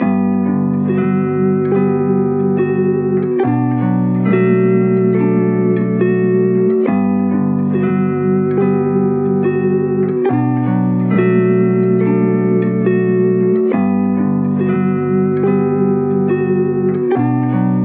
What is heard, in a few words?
70 BPM B Min Guitar; Melody Loops; Bluebird; Cymatics; ANALOG EVOLUTION Beta; Lofi Collection